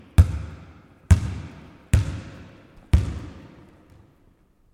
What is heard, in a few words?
Dribble Basketball